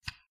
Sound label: draw out